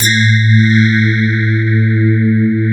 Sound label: CMI PIANO; East West; Twisted Textures-BT